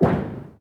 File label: Gutter